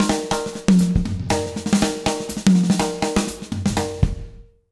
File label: BEAT, Jazz Latin Drumloops; 120 BBEAT; USB Soundscan vol.08; AKAI